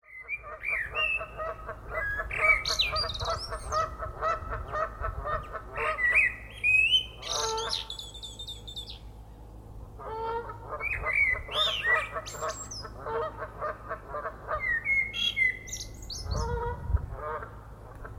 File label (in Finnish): hanhipari rupattelee; kanadanhanhi; "rupattelu; linnut